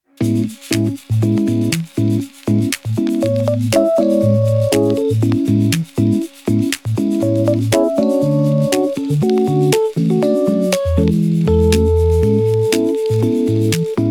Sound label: lofi music lo fi bossa nova; sonican